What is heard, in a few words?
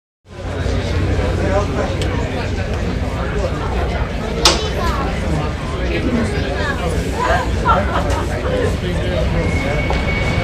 Hospital Ambience With Secretary